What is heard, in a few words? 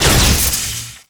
Exp Electric